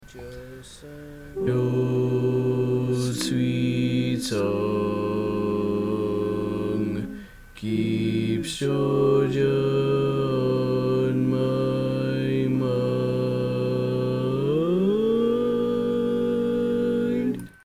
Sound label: Bass, barbershop tag